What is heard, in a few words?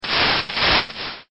broom